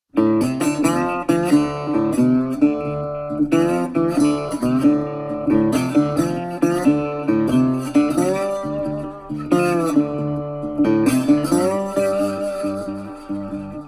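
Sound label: dobro blues instrumental; rebel galaxy ps4 windows xbox one gamerip 2015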